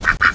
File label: roda frog stand